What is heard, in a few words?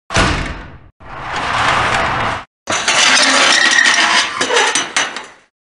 Metal shelf